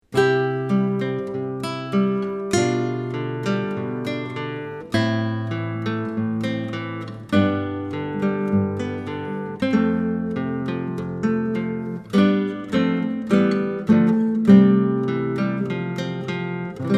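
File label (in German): Country Style Duo, Akustische Gitarre/Klassische Gitarre: Duette; leicht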